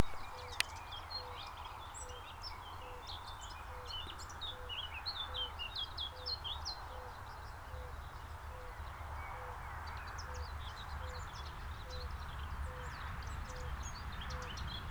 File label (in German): Gelbbauchunke; Amphibien; Froschlurche; Stimmen; Andreas Zahn